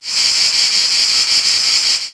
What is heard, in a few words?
far insects, ambient savanna; World of Tanks Blitz